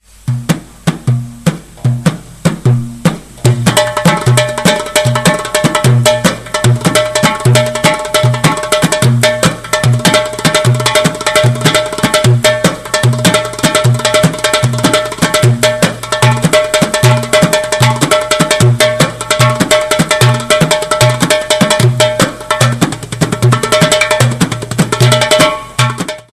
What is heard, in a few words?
arabic drums ringtone